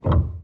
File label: piano